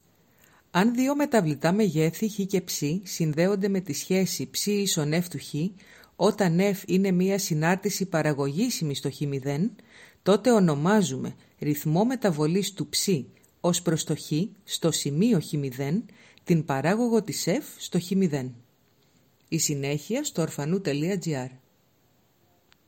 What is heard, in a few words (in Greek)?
ritmos; metavolis